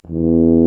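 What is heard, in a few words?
brass, TUBA C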